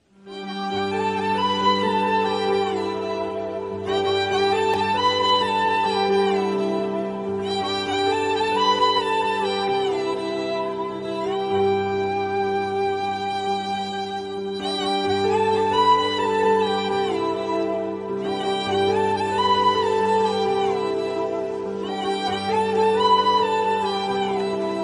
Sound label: telugu love romantic ringtone south heart touching ringtones; south movie love bgm